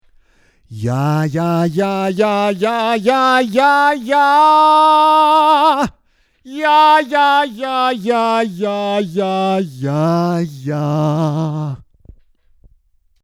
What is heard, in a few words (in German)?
Impedanz Normal Plate